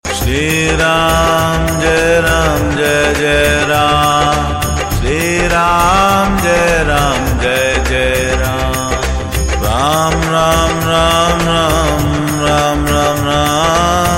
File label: jai sri ram